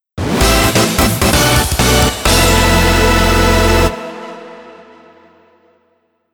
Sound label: Wrong Tone; Ginga Force